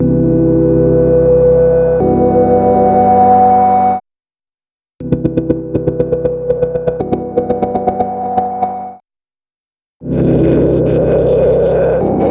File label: Pad